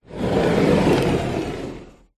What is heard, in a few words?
household door internal wood sliding open or close